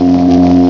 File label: Laser C Sustain